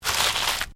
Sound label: ORGANIC TWIST; Various Organic Foley sounds; Knives; Combat